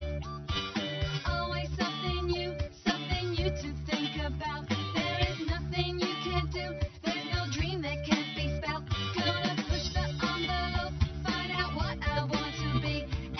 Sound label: harmonic